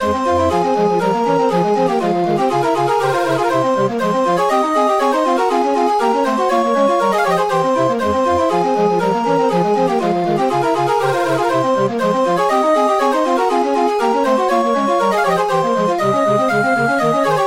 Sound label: organ